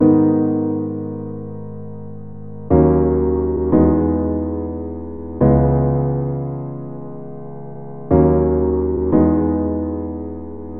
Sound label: warm piano dry; kern audio